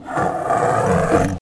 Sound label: lion die; DAoC